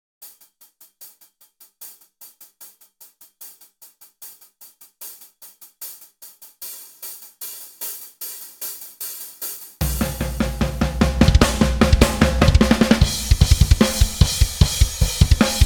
Drums I

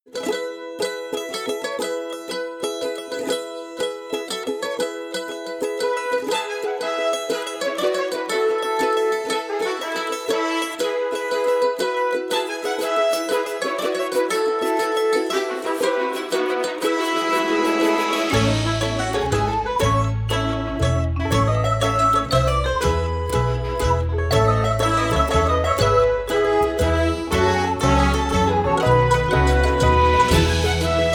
Theme; Angry Birds Fight!

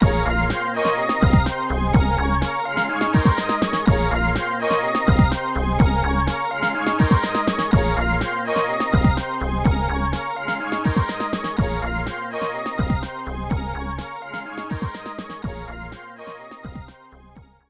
Winning Theme; Vampire Chronicle for Matching Service; Dreamcast; Gallon

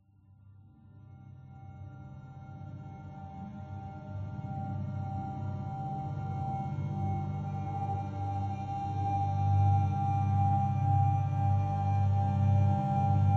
Atmospheric Mystery Loop; The Sinking City

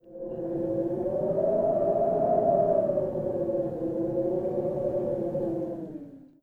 Wind Gust

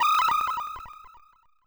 Teleport; Mummy

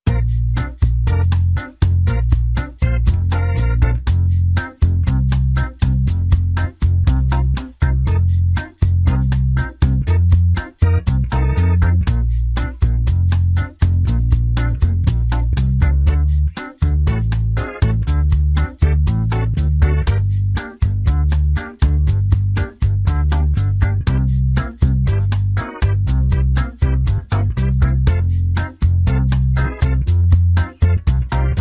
Background Loop; drawful 2 original unofficial soundtrack; family computer ios linux macos ps4

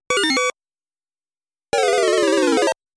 Miss; Dig Dug

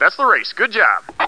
race; NASCAR Road Racing; Windows

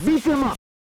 SFX Liftoff; top gun dual fighters; nes

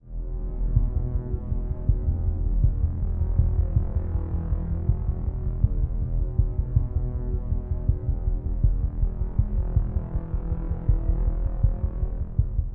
Music (Gameplay State Neutral); Music Hideout4; H4 Level Area=First Office Speech

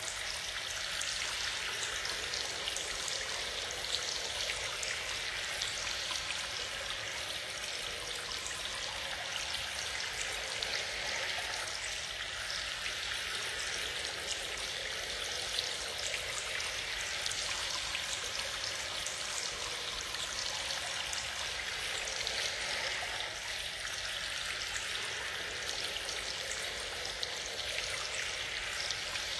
Sewer Water Light; silent hill homecoming; ps3

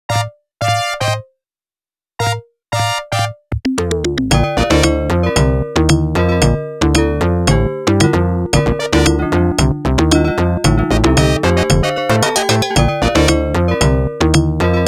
Samba

